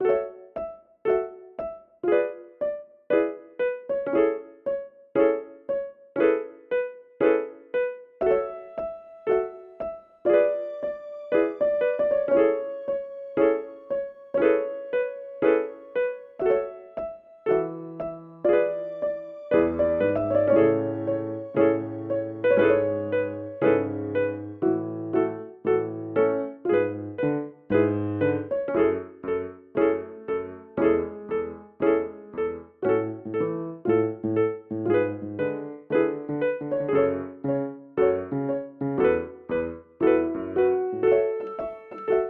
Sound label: Silence Violin